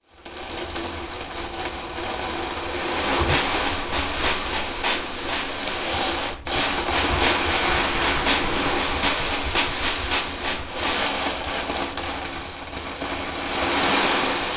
Percussion sound; Ben Duinker

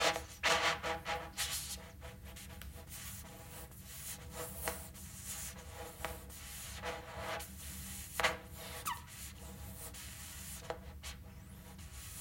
Saxophone sound

